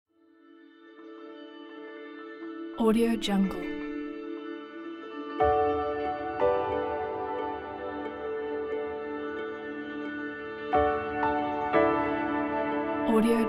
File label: uplifting ambient cinematic